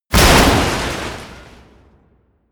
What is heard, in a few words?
smash, baugewerbe